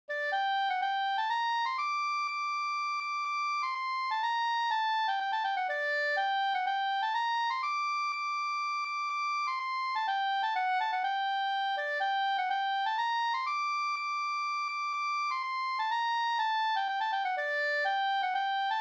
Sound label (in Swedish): polska